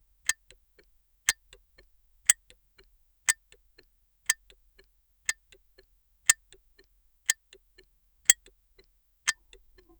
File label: blinking lights, technology